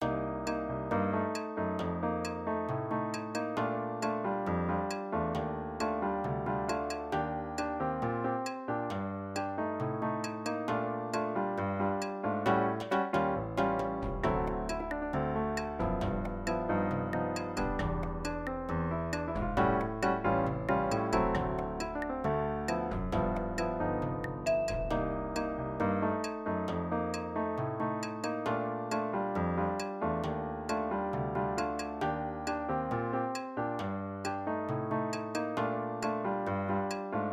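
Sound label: nova bossa with dynamics; iuri alberto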